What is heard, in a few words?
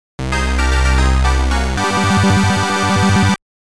Start Music; Dragon Buster